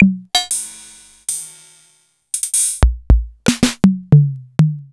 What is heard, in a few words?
Korg KR-33